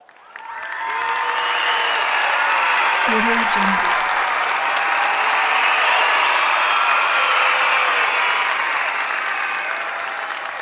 crowd cheer

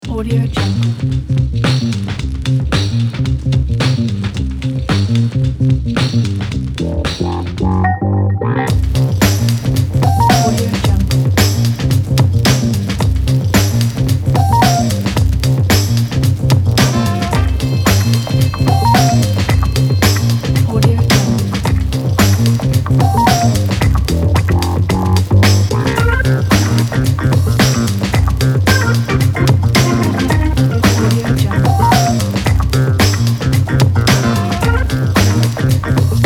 vintage funk break

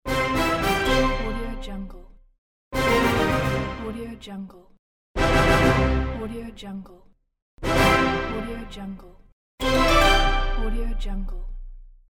game tv show music sound

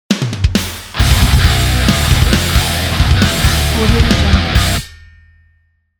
metal intro logo

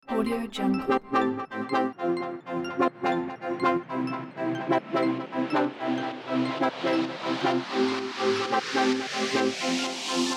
a deep house

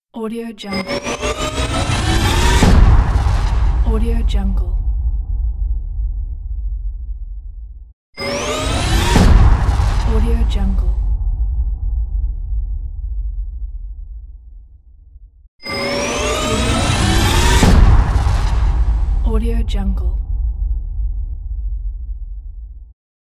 epic trailer riser impact

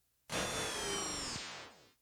synth power start ups power downs feedback

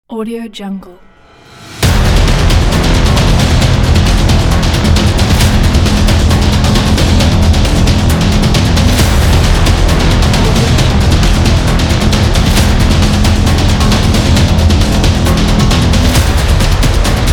epic drums

